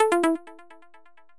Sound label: Hardware Remove